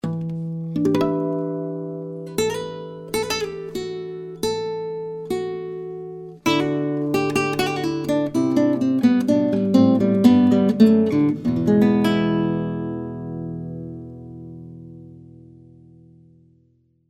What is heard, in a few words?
Classical